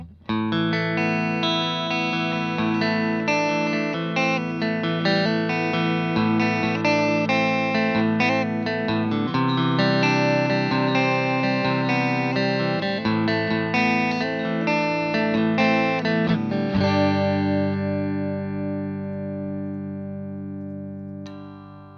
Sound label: telecaster, fender guitars